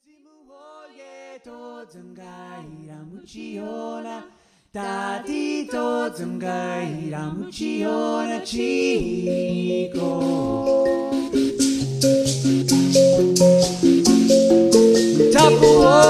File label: Marimba